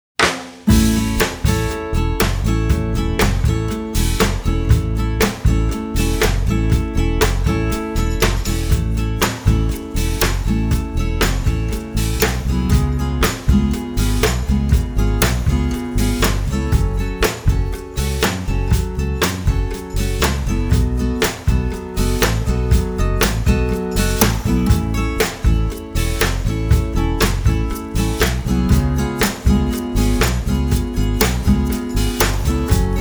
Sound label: Instrumental